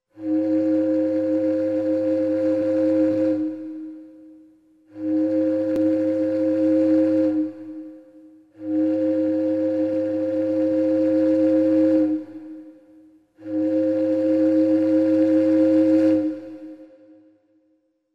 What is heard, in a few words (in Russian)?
gudok korablia